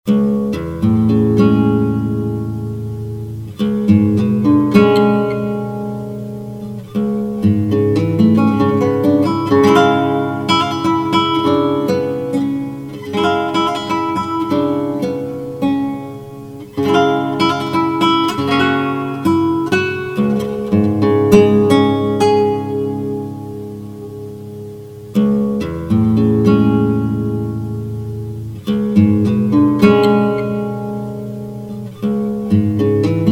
Koyunbaba

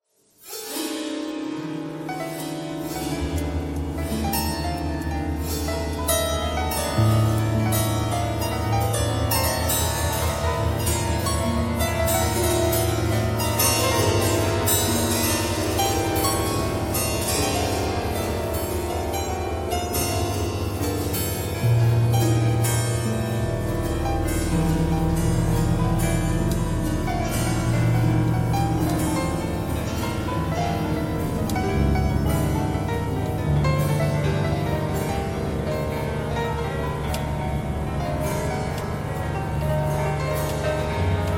piano thread noise all

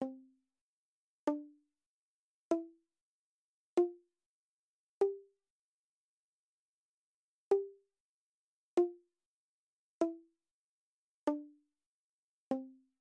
C Walking Up The Scale

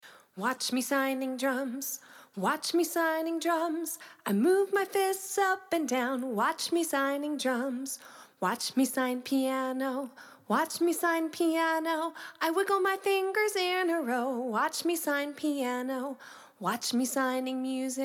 piano drums music

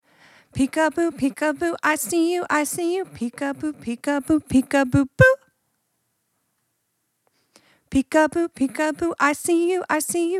Arpeggio

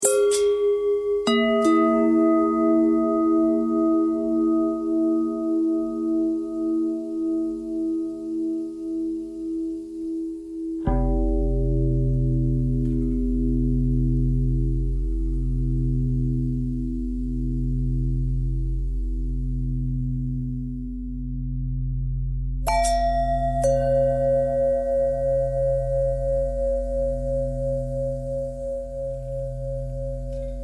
bell longbell thread bendthread

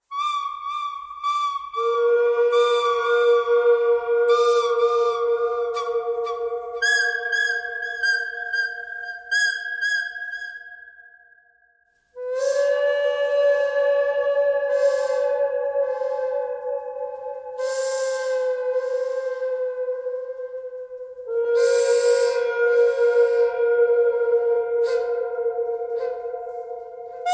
bird canyon, birds

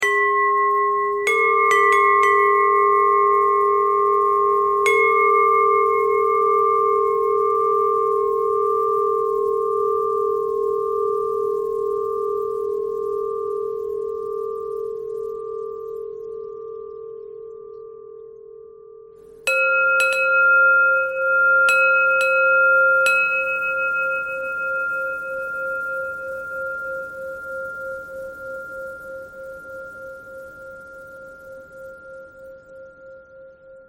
bell buzz

